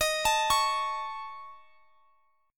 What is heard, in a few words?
C sharp Suspended 2nd Sharp 5th, D sharp